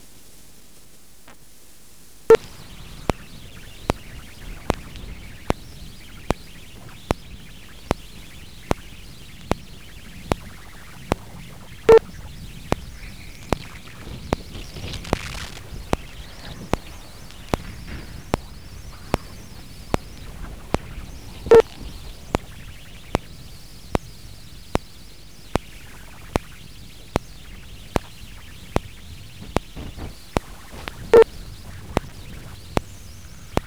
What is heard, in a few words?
Vostok mag; arctic antarctic